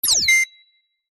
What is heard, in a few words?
Clicks; PMSFX